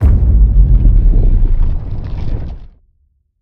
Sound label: reel Sink; asgardian stones